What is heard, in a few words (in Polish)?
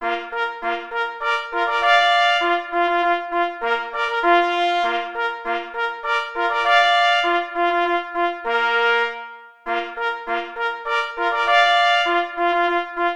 Marsz; J. Rosner